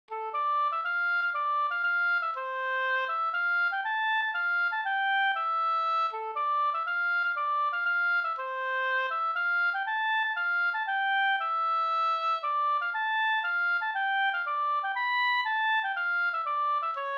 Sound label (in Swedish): polska Bodapolska